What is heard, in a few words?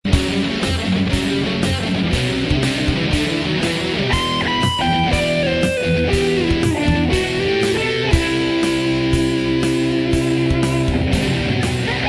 solo